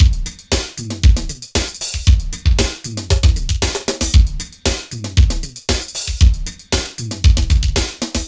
Glamour Beat, Drumloops; Dr. Fink's Funk Factory

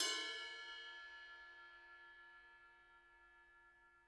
RIDE, RIDE CYMBALS; Spectrasonics - Backbeat

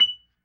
F#5 PERC, B3

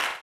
CLAP, blues & soul; ILIO; Vocal Planet

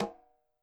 KILLER CONGA

